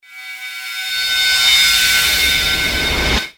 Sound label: Tonal FX F#